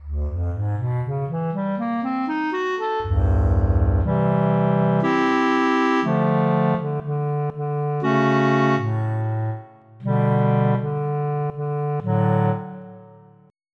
Clarinet; EverQuest

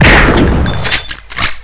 RIFLE; Cube

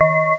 bell harm

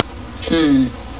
hmmm; Multimedia Toolkit

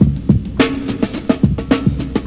breakbeat; 8-bit Sampled Voice

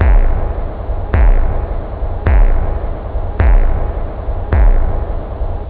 bamm!, drums technobd

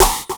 SNARE, Drum Bass, 160; eJay

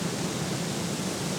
Noise; alsa

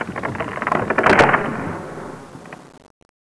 bridge Fall; NETSTORM

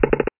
in hole

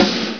Gated SD; Flashback

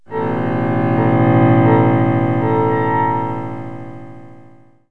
Suspense Accent; KABOOM! - Wild and Wacky Sound Software; Music; Drama & Mystery

